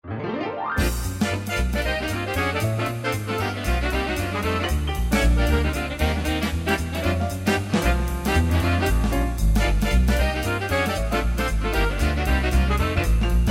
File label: woodwind